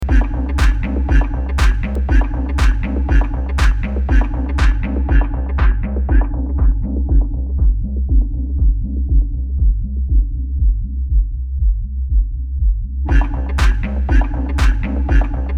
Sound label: Pioneer Toraiz SP-16 Low Cut Low Cut mit Resonanz